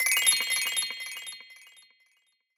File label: Selection - Glockenspiel Selection Sound; Sound Ex Machina